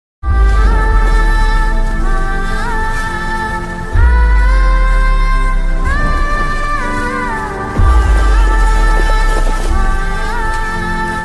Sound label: mother bgm ringtone; kabzaa; upendra